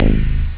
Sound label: bass; OctaMED SoundStudio V1